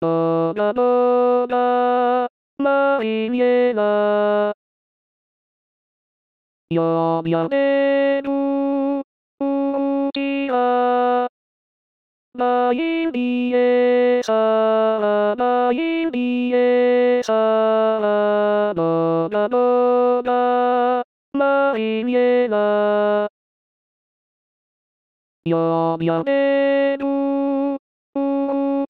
tenor

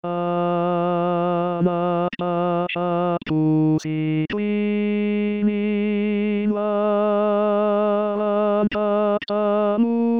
Hanac pachap Tenors

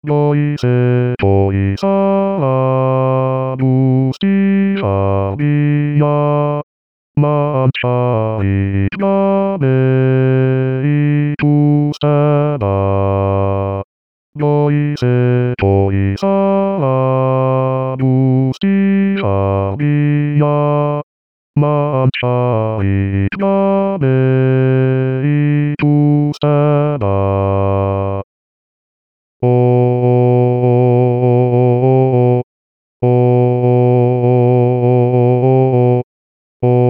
Basses; Goizeko izarra

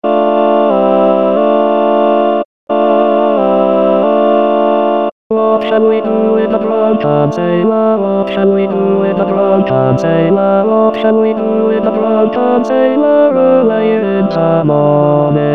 What shall we do Tutti

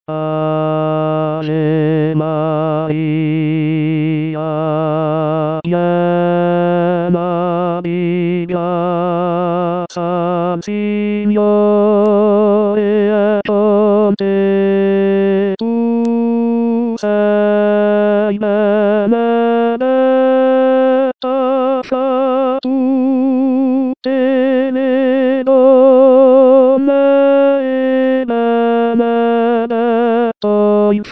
Ave Maria barytons; Italien

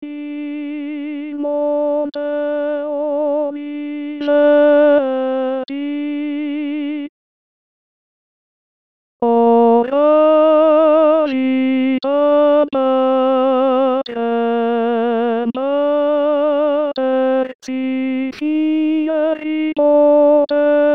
tenors; In Monte Oliveti

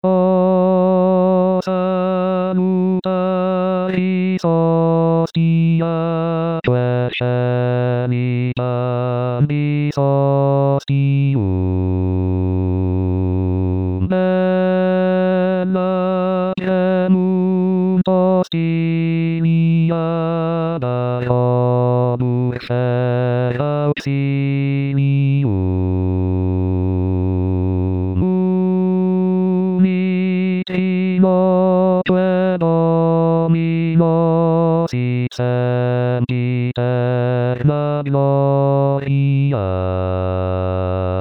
Basses; O Salutaris Hostia

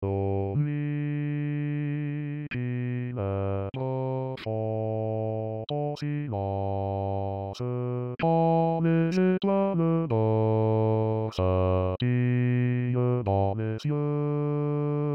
Basses; Hymne a la nuit

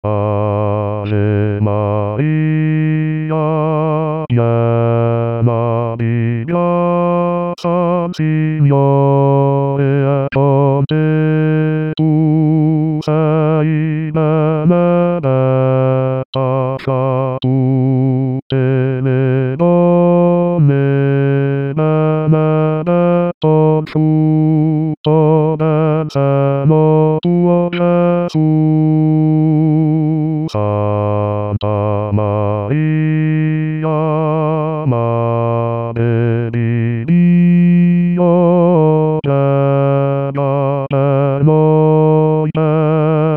Ave Maria basses, Italien

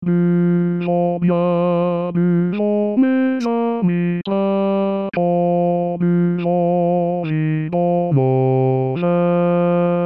basses; Tourdion

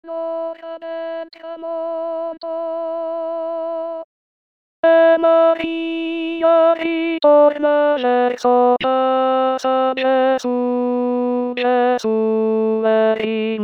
Ora Nona tenors

